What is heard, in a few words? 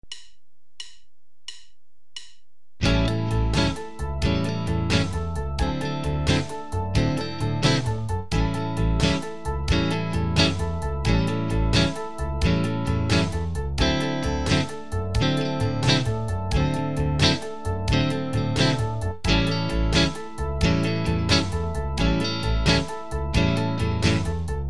12 bar blues accompaniment, guitar